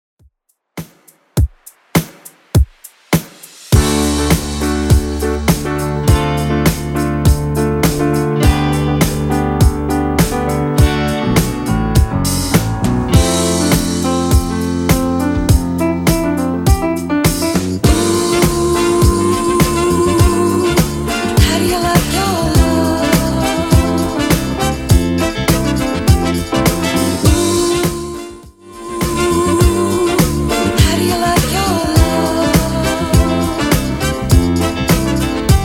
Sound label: 70s Redrum 102bpm